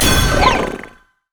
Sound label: shield; lulu skin04